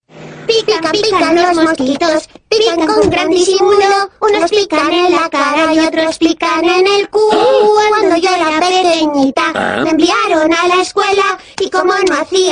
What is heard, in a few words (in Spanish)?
pican los mosquitos